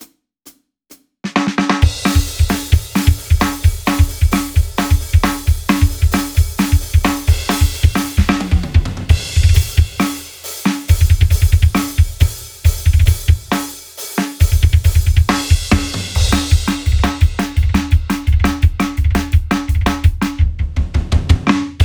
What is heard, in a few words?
metal